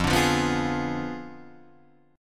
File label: F Diminished 7th F down Guitar Drop D